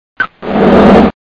door close

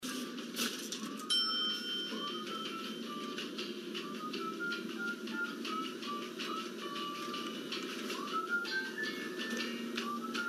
bebop song

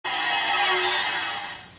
evil door closing

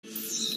hologram fade in

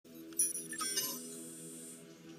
tablet notification

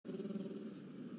hologram soft fade

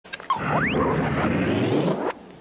door jammed opening

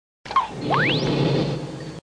door open

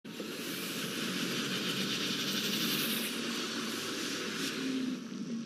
leap effect clean

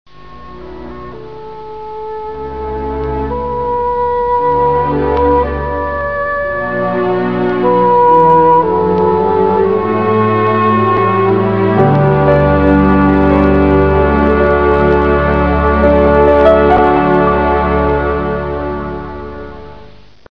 Mirror End Music

